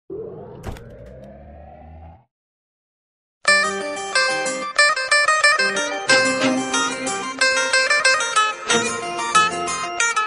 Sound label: arabic guitar for mobile phone ringtone